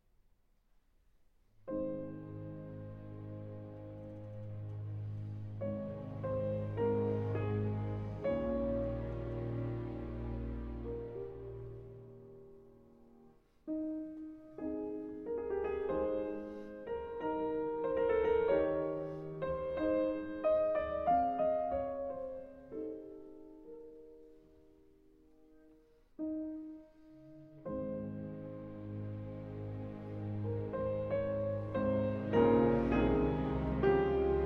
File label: Piano Concerto in C Major, Largo